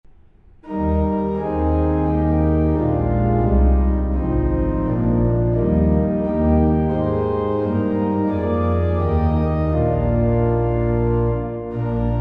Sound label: hymns organ